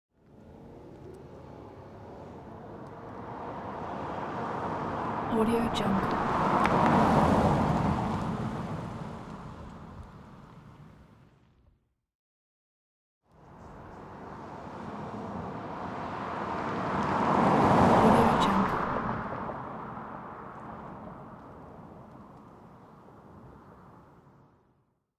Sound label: car drive by